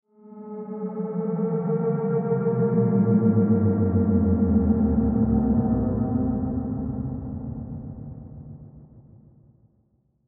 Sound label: Ghost Moans Distant Ethereal; Blastwave; Horror